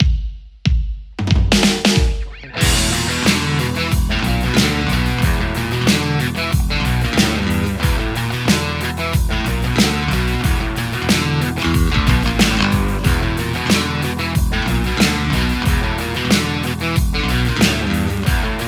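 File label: Stratocaster; mercuriall harlequin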